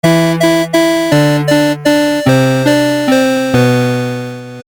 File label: Death; habitica; watts Theme